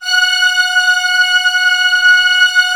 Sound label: ORCH C5 FF, Orchestra; Sound On Sound; Peter Siedleczek's Orchestra